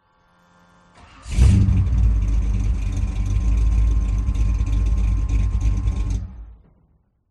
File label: VINTAGE CAR Chevrolet 57 Start Engine Exhaust Close; TRANSPORTATION